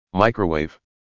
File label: Microwave